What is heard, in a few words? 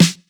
Snare